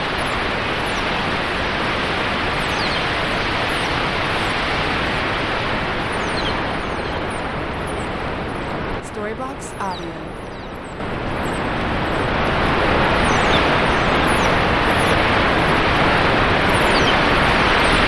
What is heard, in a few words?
Ambient House Ambience 136BPM A